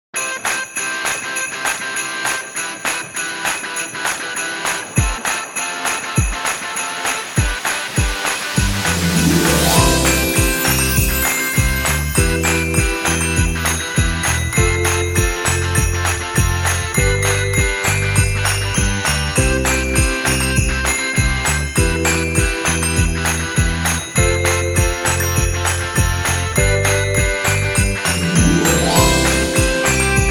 Christmas Party

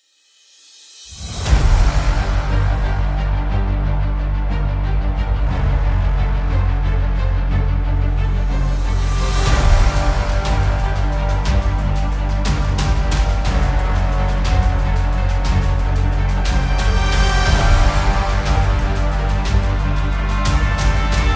Cinematic Trailer Powerful

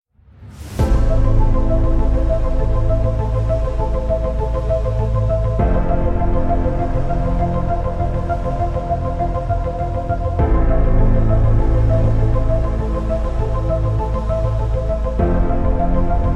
Epic Cinematic

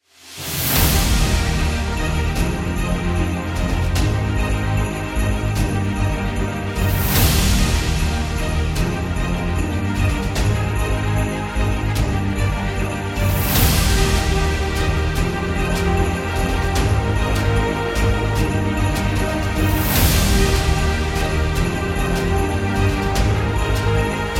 Cinematic Inspiration Christmas

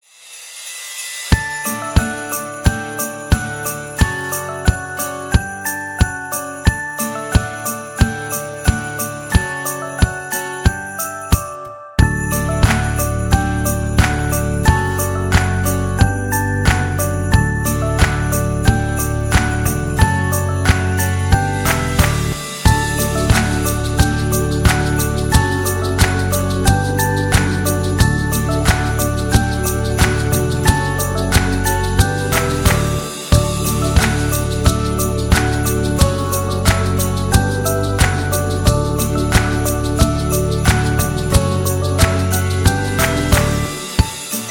Happy Happiness Christmas Kids

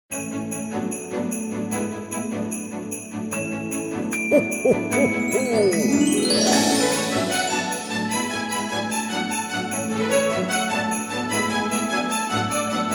Happy New Year

